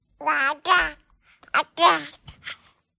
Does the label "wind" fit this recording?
No